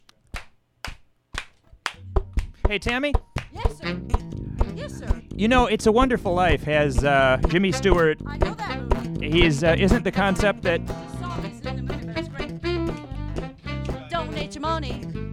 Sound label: Jingle lead in